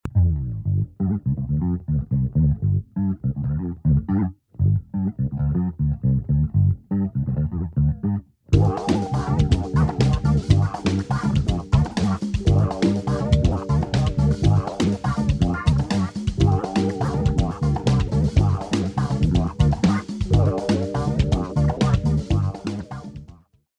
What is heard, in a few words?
WOBBLE